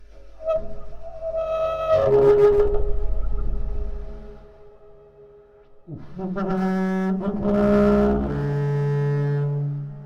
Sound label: Reed; 7te Rohr; HfK; Archaisch